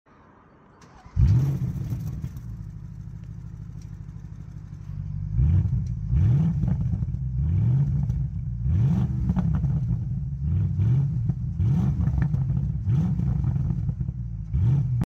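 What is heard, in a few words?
Bentley, exhaust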